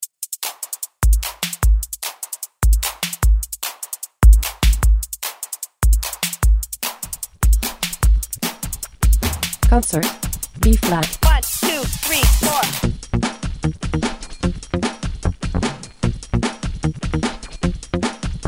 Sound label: Scale Practice 150